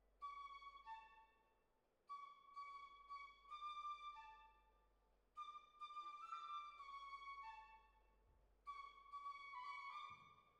Hall, Jazz for piano

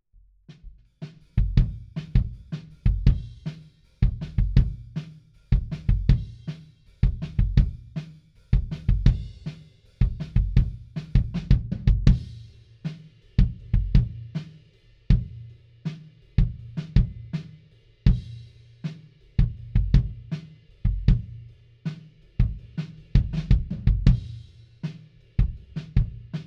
Kick In, 160 BPM

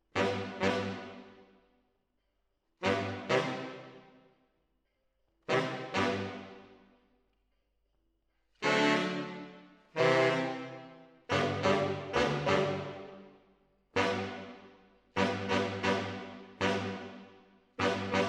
MKH AB, piano

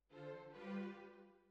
Bassoon, Jazz for piano

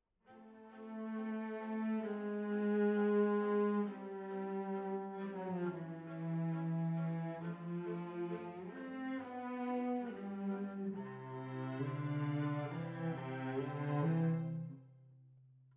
Cellos

